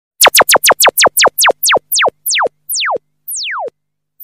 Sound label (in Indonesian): nada dering laser; grab; orderan masuk